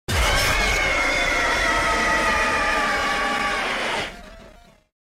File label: jumpscare